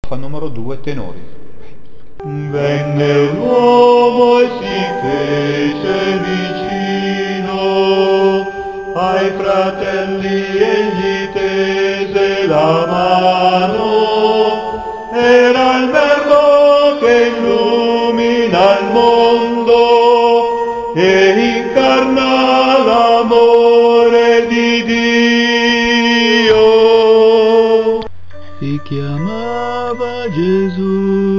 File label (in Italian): tenori